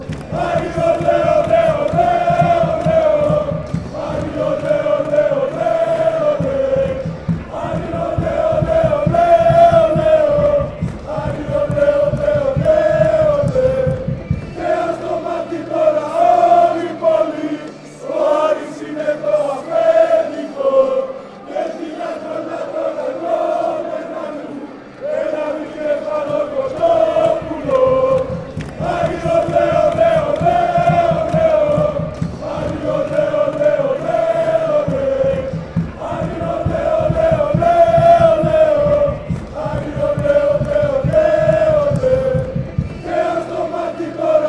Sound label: ole ole ole, chants